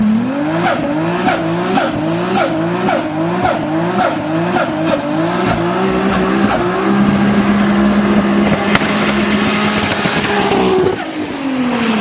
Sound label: toyota supra